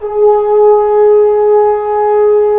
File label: BRS Tbns; Roland - LA Composer vol. 4